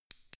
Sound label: scissors cut